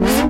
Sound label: Synth Shot E; Mutekki Media; Vengeance Electroshock Vol.2